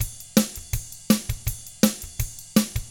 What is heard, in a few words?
ROCK, Drums; ILIO; Double Platinum Drums 1; 164 ROCK